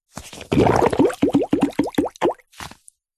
leisure whoopee cushion deflate shallow water bubbles hiss fart squelch